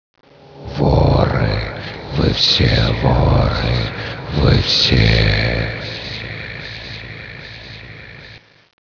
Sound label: zombie last; zombie plague